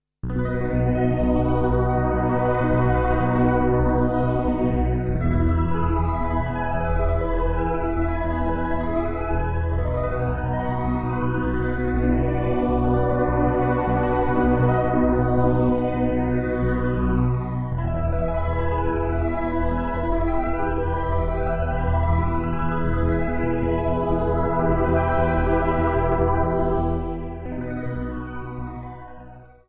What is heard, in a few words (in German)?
Sound, Synthesizer, Sequenzsamples; virusc; (Wohlgemerkt, hier spielt niemand einzelne Töne, es werden lediglich Akkorde im Abstand vo